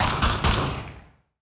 hunters aid attack; heroes; anya

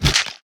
screecher death; bittering rancor

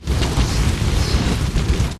gryphon shot

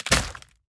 death; crocs; crokinder

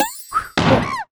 hero hair clones

